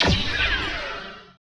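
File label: FG SHOTS, future gun; Total Control - Action Cutz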